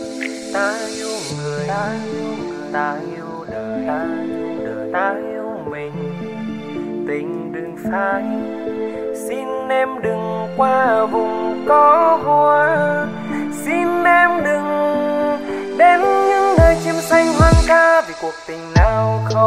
Nhac Chuong Lofi

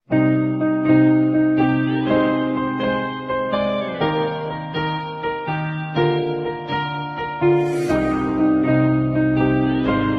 không lời piano version

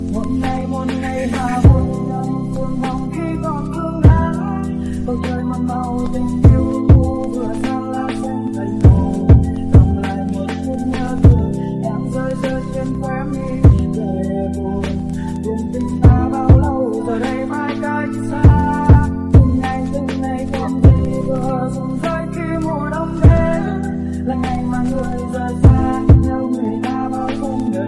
Nhac Chuong Lofi

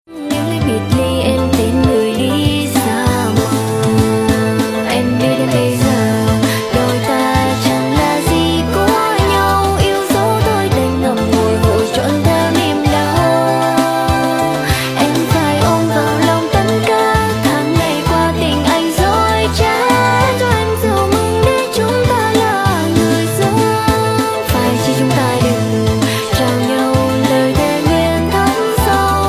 cover giong nu

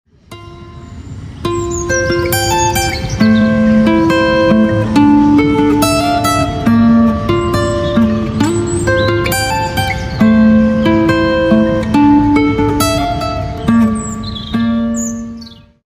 guitar không lời

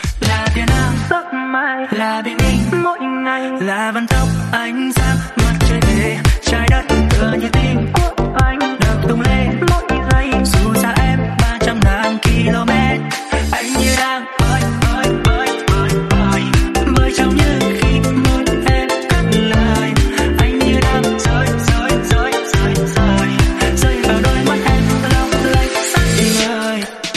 Nhac Chuong Remix

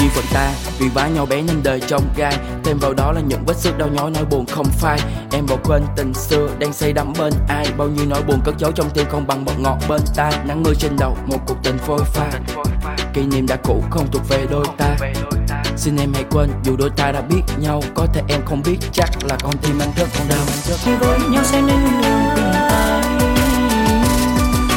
Nhac Chuong Rap

